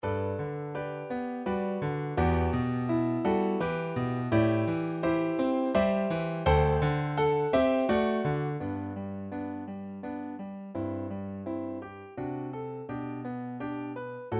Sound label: piano; 故郷１